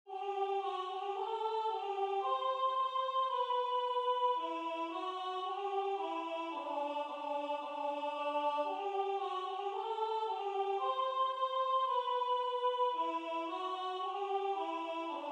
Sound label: Soprano